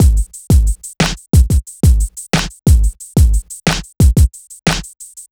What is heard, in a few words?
Dancehall 90 bpm, drums; Loops; Ableton Live 12 Lite; Core Library